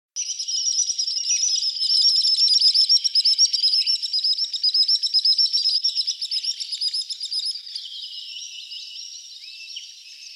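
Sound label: porucheynik golos, marsh sandpiper voice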